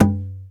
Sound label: Caj Slap, EMU Orbit-3 drum machine; 300 Drum Machines